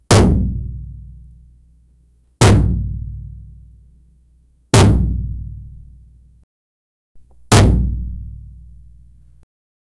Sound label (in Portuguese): socos poderosos, punch